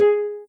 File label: soft hitwhistle; osu